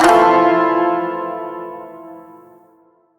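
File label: Shawzin Major Chord F; Chords; Warframe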